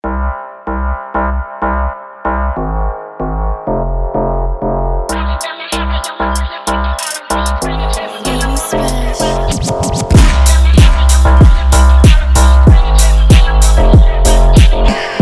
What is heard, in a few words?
Phonk